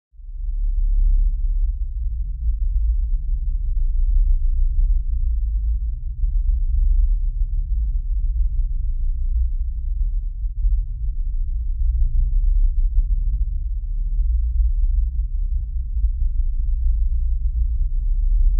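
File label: Electric Drone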